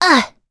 Vox Damage; kingsraid; en

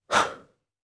Sigh, jp; Riheet; kingsraid; voices heroes

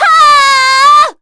Vox Casting; Scarlet; kingsraid

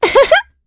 Vox Laugh; en; Estelle; kingsraid

